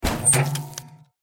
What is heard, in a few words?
Spring step; The Joy of Creation Halloween